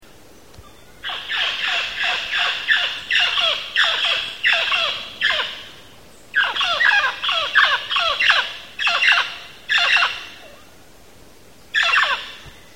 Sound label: mealy parrot, Belize birds birdsongs